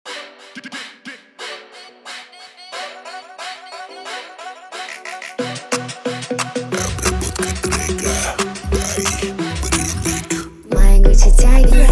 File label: Low Bass 33-37Hz